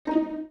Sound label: dial tone orchestra pound; Oppo Neo 5